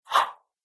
Karate Action Swish, combat swing sound effects; whoosh

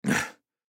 Human Grunt; Grunt Element Male 20 Yrs; Grunts; Sound Effects:Voice

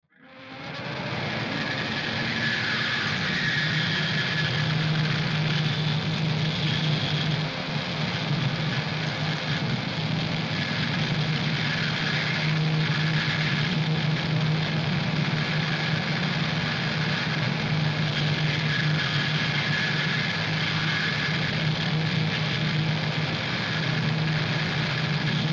Horror Drone Distortion; Scary Tones; Blastwave

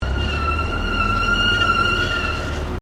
Car Tyres Squealing; various vehicle sounds; Cars

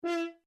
Horn E5 Short Stopped, French Horn in F; Alexander French Horn in F; Musical; Horns

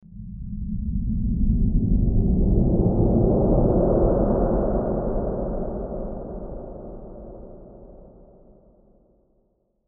Sci Fi Spaceship; Sci Fi Spaceship Pass By Space Whoosh; Spacecrafts

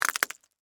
Texture, Crunch, Short, Pasta, Shells

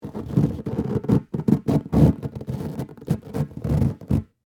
Exercise Ball, Sports, Handle, Large; Miscellaneous Sports; BlastWave

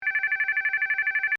Phone Cell Ring Generic; Cell Phones; Electronics; Big Room Sound

